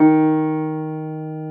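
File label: piano D#3, soft piano; AKAI S-Series CD-ROM Sound Library VOL-2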